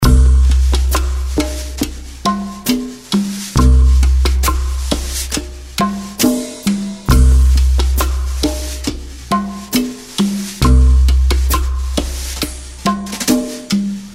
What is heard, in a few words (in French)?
fondamentale do